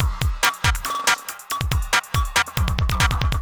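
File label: industrial 140 kit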